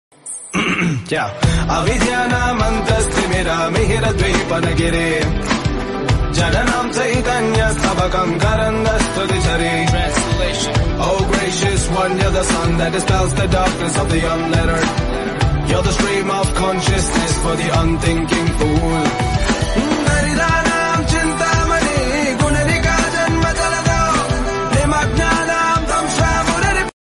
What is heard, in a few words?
aigiri nandini background music